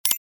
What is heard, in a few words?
Christmas game menu ui navigation